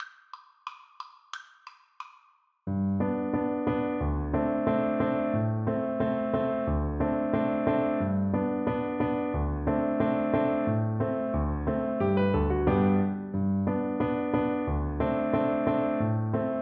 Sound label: hornpipe, cello